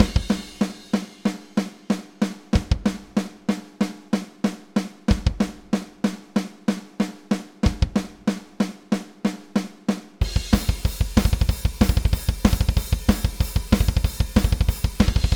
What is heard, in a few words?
pearl masterworks metal 188bpm; toontrack superior drummer 3 test